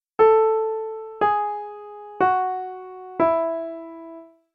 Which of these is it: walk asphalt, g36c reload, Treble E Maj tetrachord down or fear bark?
Treble E Maj tetrachord down